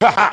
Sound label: ha ha, Voice